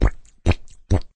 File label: Cartoon Walking; WoWInterface; WeakAuras